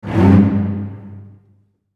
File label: bass gliss up